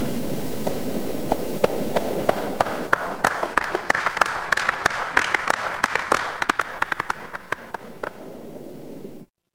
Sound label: crowd applause